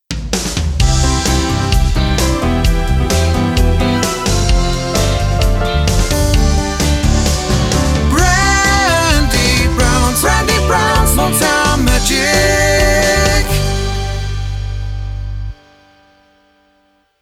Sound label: Jingle Motown